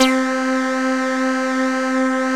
CS-15, BASSES

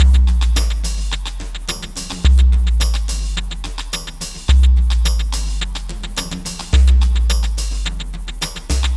Elektro, Processed Percussion

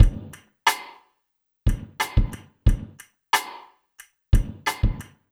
Rim Blot, Beats; Sonic Foundry (Sony Creative Software); Synchro Funk

